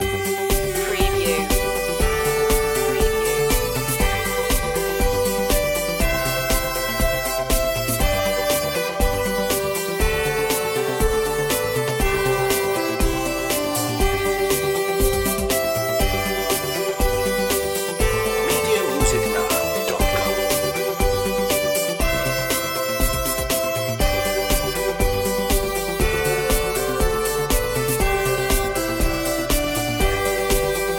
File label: auld lang syne synthified